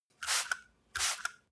spray bottle double, haushalt; haus garten

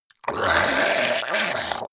zombie grunt choke male long; kreaturen

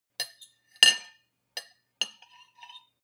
cutlery on plate clatter and scrape long; berufe; soundpool

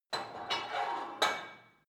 put weight onto barbell quick, fitnessstudio

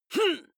male fight groan; vocals; comedy-cartoon; soundpool

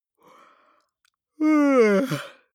male yawn vocal; koerpergeraeusche; menschen; soundpool

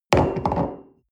coffee place table hard wobble, restaurant cafe

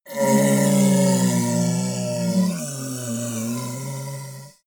monster dark growl hoarse; kreaturen

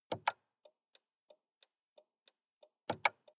sports car int blinker short; verkehr transport; autos

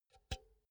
pull out cinch quick; objekte; elektronik

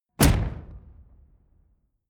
sci-fi shot zing subtle; schusswaffen

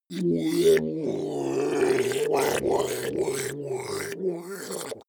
ice golem melt groan slowly; koerpergeraeusche; comedy-cartoon; soundpool

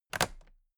toolbox latches close heavy; industrie wirtschaft; handwerk

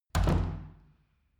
wood door old reverberant soft

